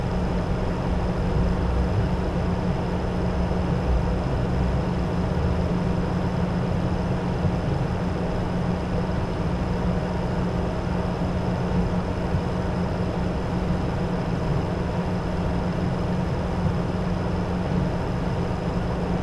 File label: fan loop